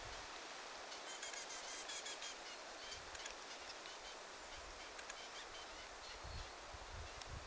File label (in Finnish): hernekertun poikaset kommunikoivat jotain